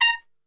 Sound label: A# 3 X PIC SHT, Brass; Best Service; Brass Super Section